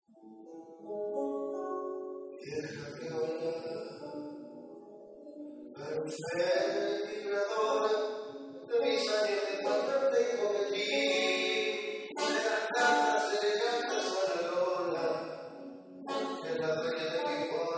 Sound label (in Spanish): tango